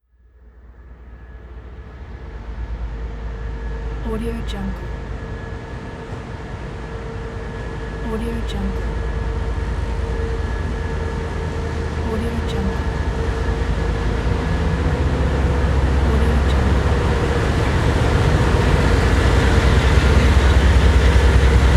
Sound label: long freight train